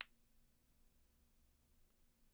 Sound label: thai ching; Thailand